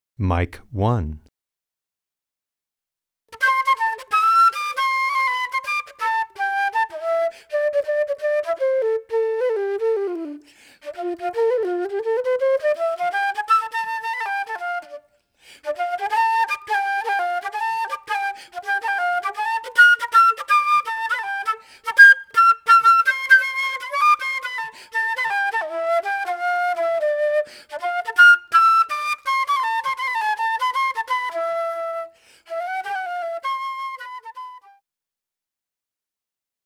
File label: Flute